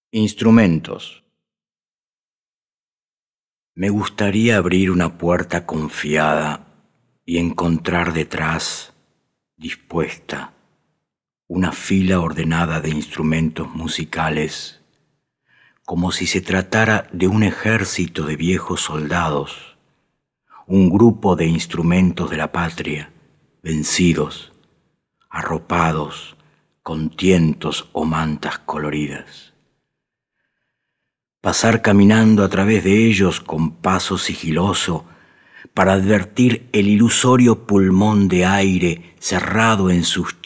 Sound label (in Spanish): Instrumentos Pianoforte; codigo morse